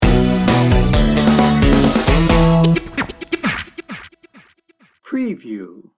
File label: groove sting bumper